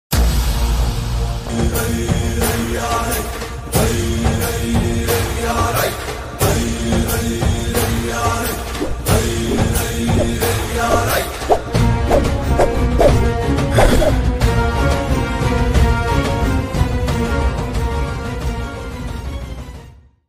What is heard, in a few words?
bgm ringtone background music bgm telugu movie bgm